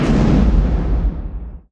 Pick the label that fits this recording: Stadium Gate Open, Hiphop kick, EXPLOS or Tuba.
EXPLOS